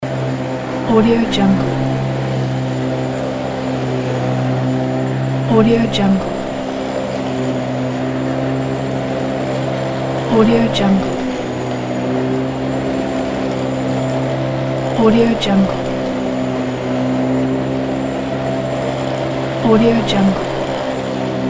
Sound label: lawn mower